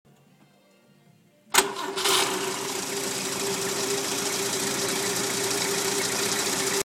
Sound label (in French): Demarrage F4